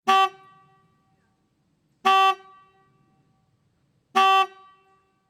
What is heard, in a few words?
motorcycle horn